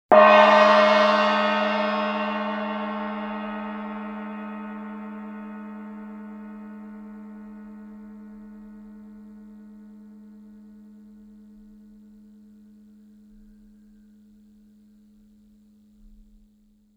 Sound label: sweet, gongs